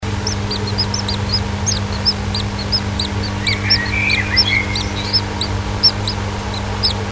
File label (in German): kueken; nistkasten